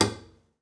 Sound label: pizz noise slap, double bass